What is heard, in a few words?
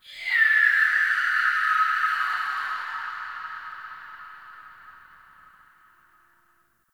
Day Screechy Creature; Ambience; One Offs; Glades Of Andurin